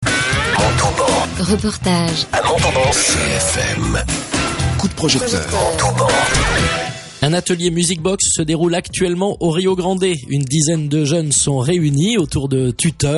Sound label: MUSIC BOX